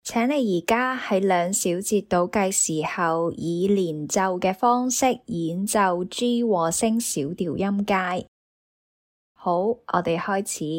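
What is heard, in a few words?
G minor harmonic scale legato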